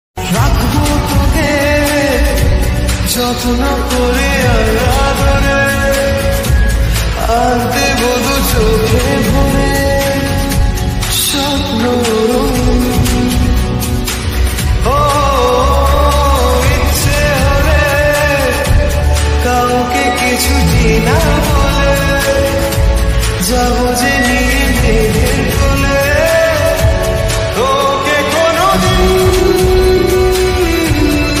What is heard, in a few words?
Bengali Ringtone